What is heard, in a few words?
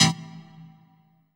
HOUSE, House Dance